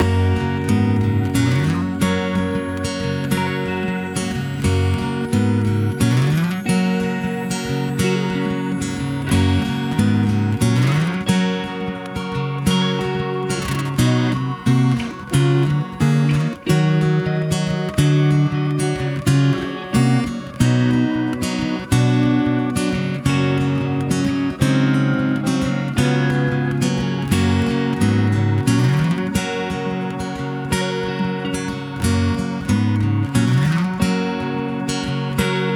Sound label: night time instrumental